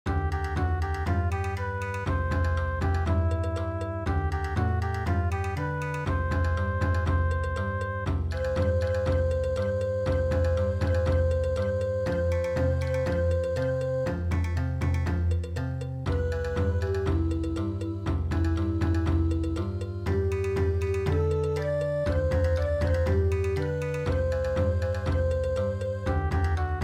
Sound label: Bon Odori; ANAHOLA